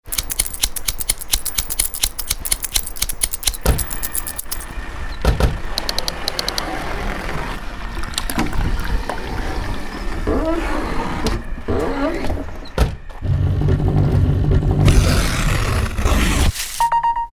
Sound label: remix